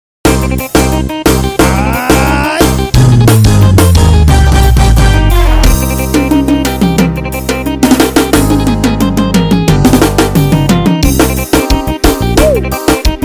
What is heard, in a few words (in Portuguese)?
forró; Parnarama MA